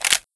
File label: sg552 inspect, weapons; czero opforce